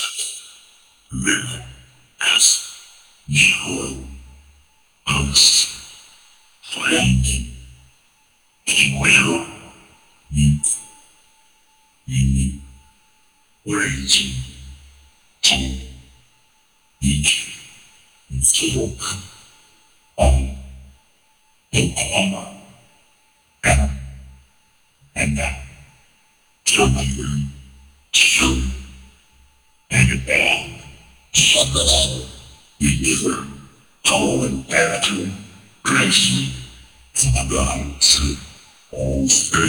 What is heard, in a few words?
man transforms into monst